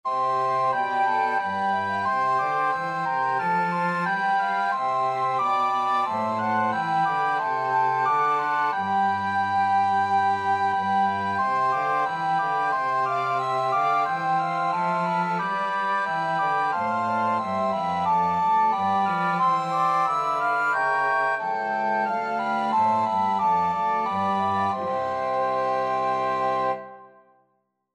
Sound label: flexible mixed ensemble, 4 players